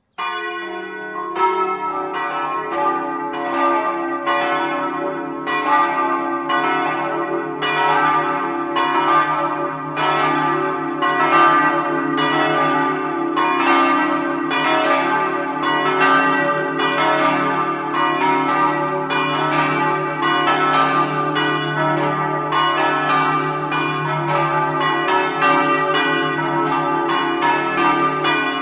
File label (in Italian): campane; turriaco